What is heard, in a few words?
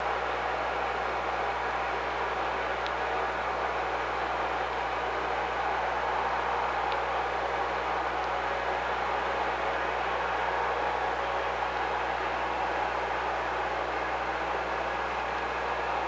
OrcaLab